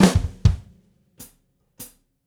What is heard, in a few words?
Gretsch groove 90 BPM, 1967 Gretsch Kit drums; Sonic Foundry (Sony Creative Software); Vital Drums